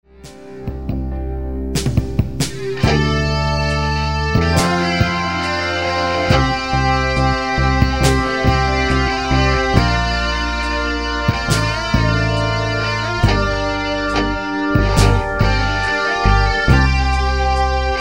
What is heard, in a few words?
guitar solo